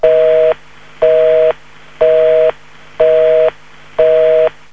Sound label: PHONE, 电话; 设备音效; 办公设备; 特酷声音素材库